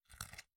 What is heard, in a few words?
bite soft; angband